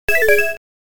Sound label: Obtaining a Key (SFX)